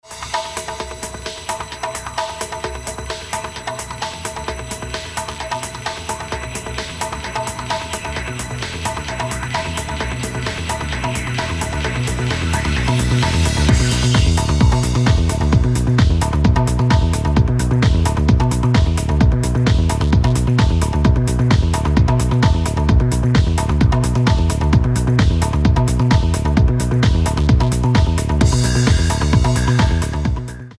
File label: Instrumental